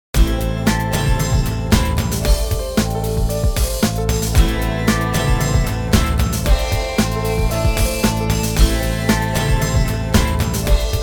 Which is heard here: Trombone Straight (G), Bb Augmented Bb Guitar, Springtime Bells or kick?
Springtime Bells